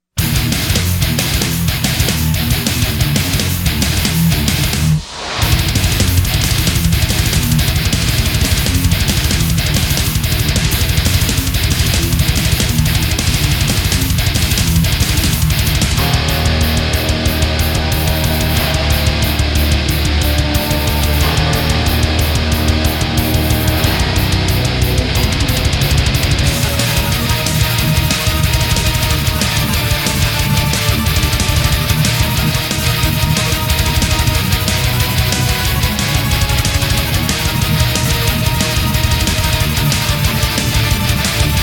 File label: Instrumental